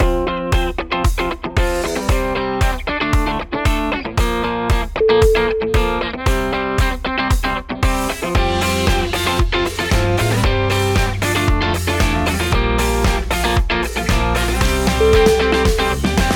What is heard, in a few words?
punk